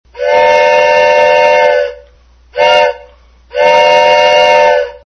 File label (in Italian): treno far west